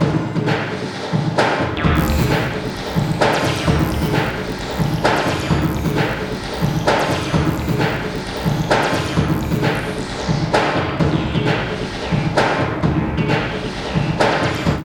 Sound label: LP, Tekno Industrial; East West